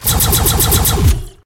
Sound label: skill1 beam